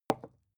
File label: glass put down heavy; objekte